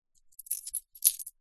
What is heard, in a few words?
money coins small x4 handling short; foley; zapsplat